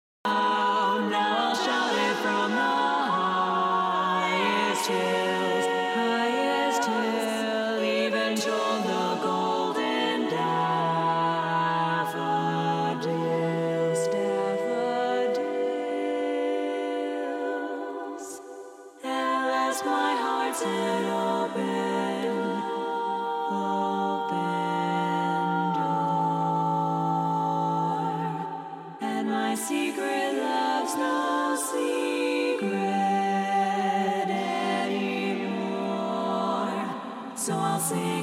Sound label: Lead mute, harmony